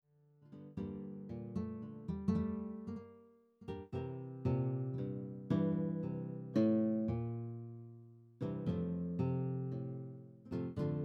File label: Classical Guitar